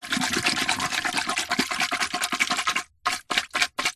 leisure toy plastic water pistol shake water inside